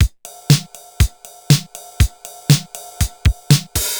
TR 505 (120BPM), beats; musicradar; cyborg beats

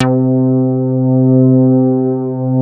MOOG BASS C4; Best Service Dream Experience

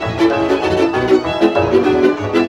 VIOLA BREAKS; Best Service; World Colours

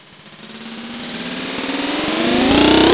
RUFFLE, PERC SWEEPS; ILIO; Trance Fusion

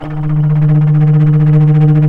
bass flute, flutter; Roland L-CDX-03 Disk 1; Bs Flutter

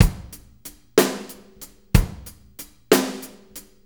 6-8 Loop, Full Kit Loops

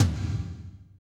TOM, Roland; Northstar - Drumscapes Roland

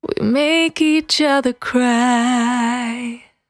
Cry 68bpm B, Vocal; Zero-G

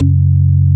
ORGAN

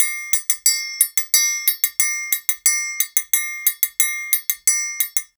90, Percussion Loops

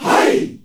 GROUP HIE, SHOUT, Heart of Africa; Spectrasonics; Heart of Africa 1; CH. SHOUT1

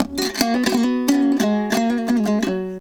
HALAM MELODY; Best Service; World Colours

